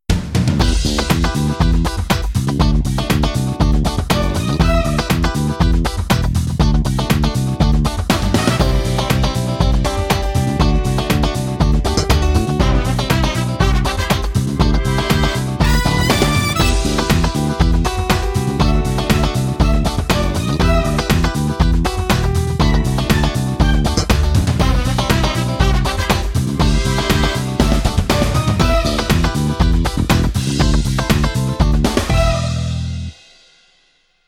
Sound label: Funky